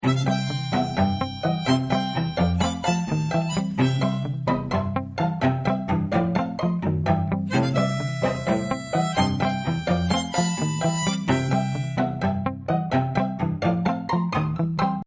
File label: Indoor Tutti; Indoors